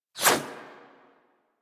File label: CY Xbow 2D Whoosh A; Tenno